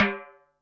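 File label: Drum Analog Kit Timbale High, Yamaha RM1X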